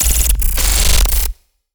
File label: textures screen hard glitch; Sinematic 2; soundmorph